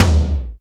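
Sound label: GOGO tom, GO GO DRUMS; Crypton - DrumBase5000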